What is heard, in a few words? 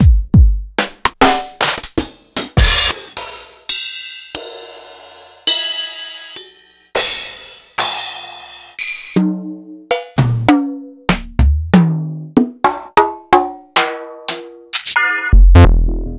Drum Bass, proteusvx